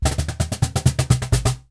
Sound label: Cajon Fill; musicradar